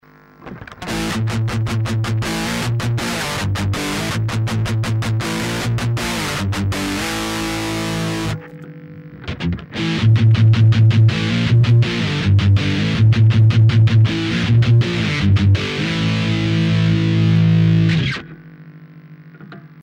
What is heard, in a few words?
Speakersim Riff